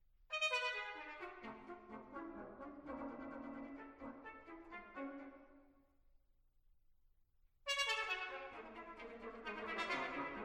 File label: Brass Quintet, Scherzo